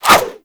Sword And Whip Whoosh; Warframe; Weapons

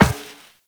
Walk Metal Perforated, footsteps

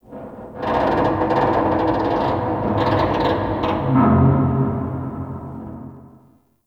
Stadium Metal Creaks Medium; Warframe; Ambience; Nineteen Ninety Nine

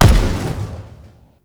Rifle Explosion; Warframe; Venus; Venus Vip Rifle Spaceman Agent

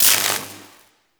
Elec Spark; Warframe; Nokko Colony; Ambience

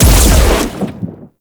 Fortress Overhead Smash Attack Impact; Warframe; Grineer Nightwatch

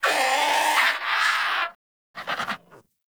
Bird Of Prey Vocal Idle

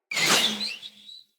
Bow Ranged Arrow Projectile Start; Weapons; Envoy Hunter Bow

